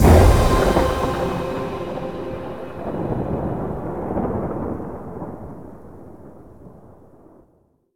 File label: Combo C; Mixolumia